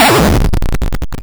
Hero Explode; Audition

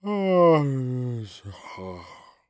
Human Male Yawn

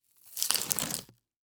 Emergency Band Aid Tearing Apart; emergency effects; AdobeCC

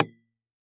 Impact PVC Pipe Hit By Copper Pipe; Impacts

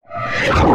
Multimedia Internet CD-Rom Flash Wipe

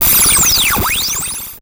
Title Transition Digital Readout; Production Element Title Transition

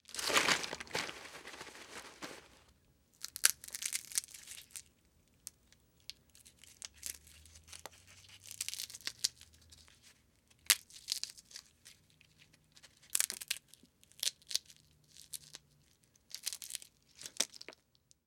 Household Peanuts Open Bag And Crack Shells

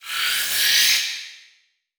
Transition Whoosh Medium; Production Element

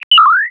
Astro Droid; Membrans; Astro Droid Sound Pack 01; GDC Game Audio Bundle

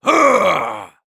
Human Male Roar Angry Ggrrr

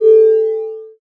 Beep Low Pitch; Multimedia Internet CD-Rom Flash

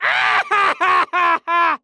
Horror Scary Human Male Laugh Cackle Laugh

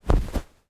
Pillow Fluffing, Household

